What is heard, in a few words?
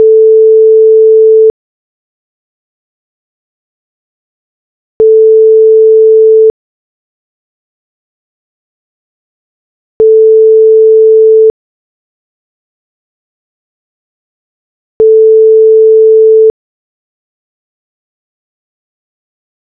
call